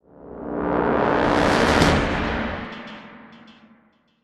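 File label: sting, brass swell; transformers war for cybertron gamerip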